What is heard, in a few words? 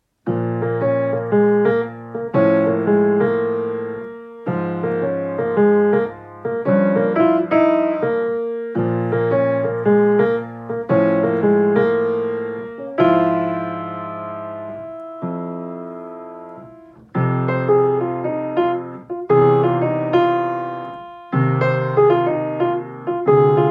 Walk in Bb Minor; 14 years old